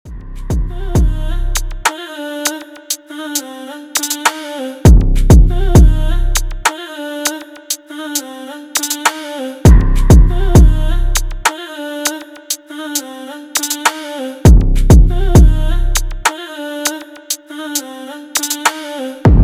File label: acapella break